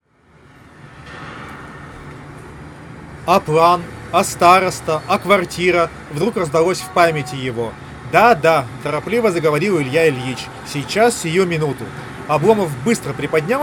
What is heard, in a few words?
Recorder noise; huawei freebuds se 4 anc